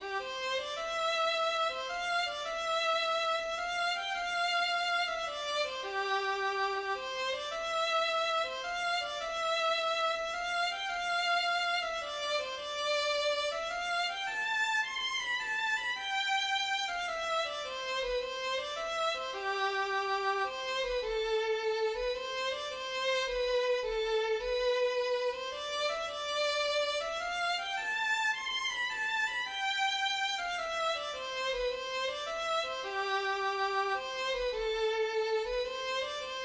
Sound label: mazurka